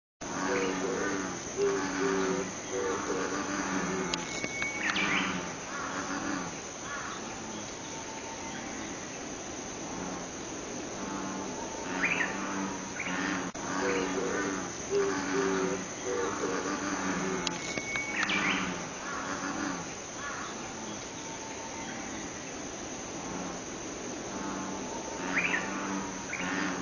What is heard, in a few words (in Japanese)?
usigaeru; ikimono; doubutu